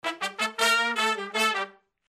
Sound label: brass loop